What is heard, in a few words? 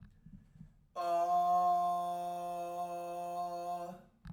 F3 chest, Chest voice, singing